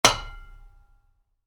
Wood hit metal ring, Wood, Light Impact: Metal, Rings Out; Materials; Stuart Duffield